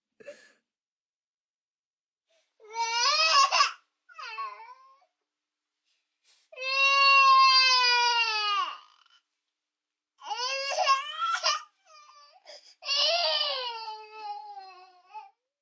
Crying Toddler, Crying, 1 toddler, distant, long, human voice, kid, child